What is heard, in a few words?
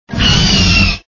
attack